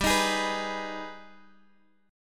G sharp Minor Major 7th G

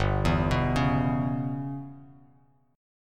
G 6th Flat 5th, Bass Standard, G6b5 chord; G6b5 arpeggiated; JGuitar

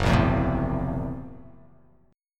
F sharp 7th Sharp 9th Flat 5th F sharp down; strummed; JGuitar

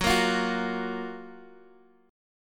Gb Major 7th, down, Guitar, Half Step Down

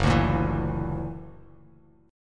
Gb Augmented 7th Gb down, Bass Standard; Gb+7 strummed

